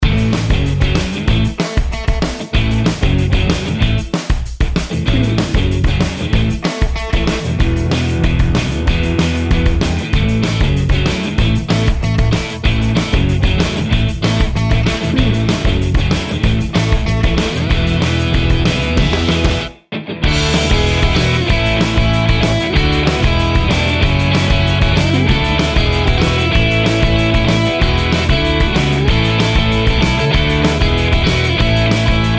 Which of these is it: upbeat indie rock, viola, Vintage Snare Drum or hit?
upbeat indie rock